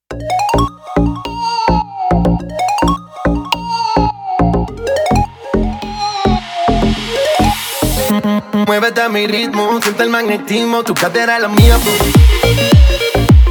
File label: Ringtone